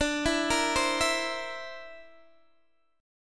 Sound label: D 7th Suspended 2nd Sharp 5th D, Guitar DADGAD